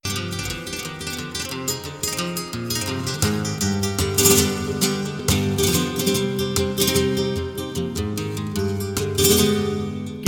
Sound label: Fandango; de Calana